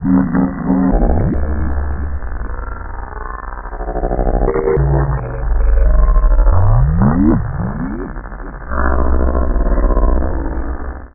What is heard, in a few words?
Atmo FX; Sonic Foundry (Sony Creative Software); Leo Cavallo Bass Taster; Special Fx